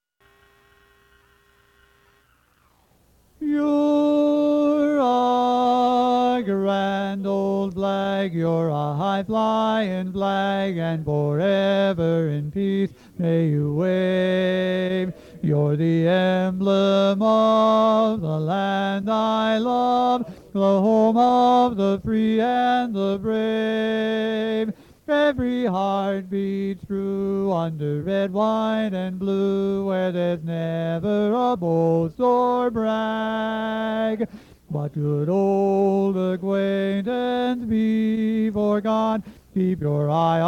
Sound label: Lead